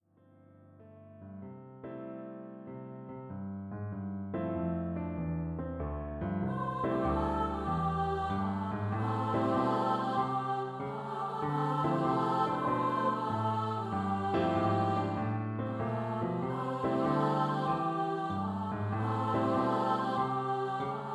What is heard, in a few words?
2 part song for Ladies Choir